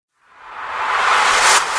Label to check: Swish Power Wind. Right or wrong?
Right